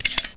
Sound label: dual de r clip out, weapons